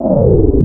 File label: INST FX, Ragga & Dub Reggae; USB Soundscan vol.27; AKAI